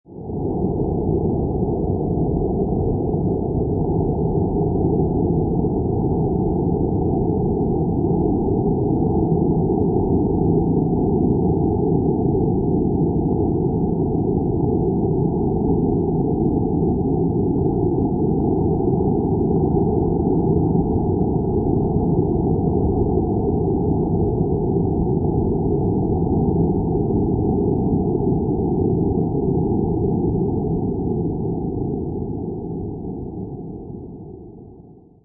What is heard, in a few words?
Space Wind Rumble, Solar wind, resonant and eerie space atmosphere; Science Fiction Sound Effects; Lynne Music